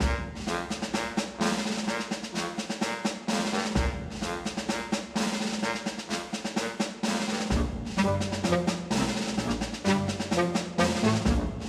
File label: Base Music Loop; sugar Parade; Mahi Gaming